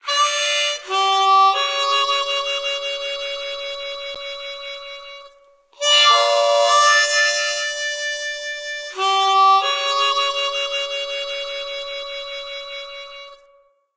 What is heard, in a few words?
Cabin Stinger; Back to the Future: The Game; Android; PS3; Wii; Windows; Xbox 360